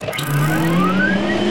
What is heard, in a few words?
assault cannon wind up; weapons